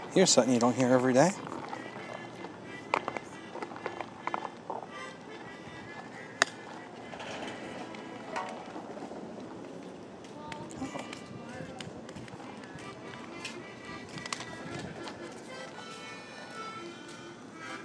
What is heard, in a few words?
polka on the platform